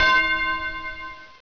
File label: vamp Start; Space Joust